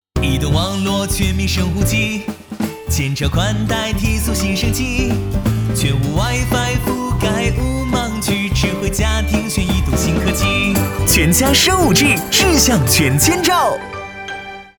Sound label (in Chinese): 唱歌广告; 中国移动